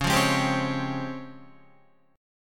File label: Db Major Sharp 11th, Guitar; strummed